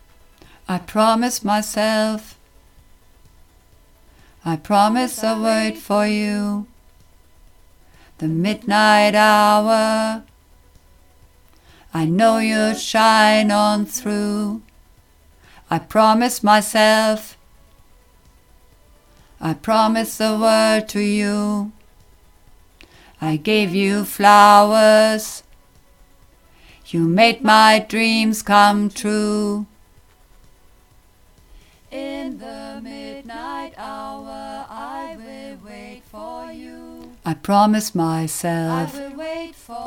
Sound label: Bass End